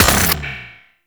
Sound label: Shot; Warframe; Weapons; Corpus; Crp Charge Gun; Cycron